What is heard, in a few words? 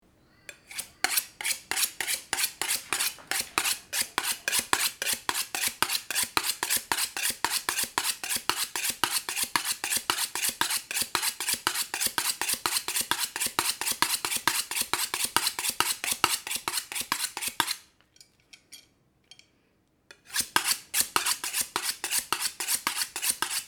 kitchen sounds chef sharpening knife on steel various speeds